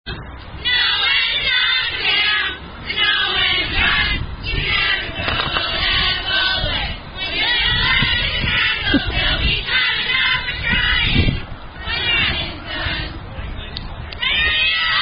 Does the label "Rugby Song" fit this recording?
Yes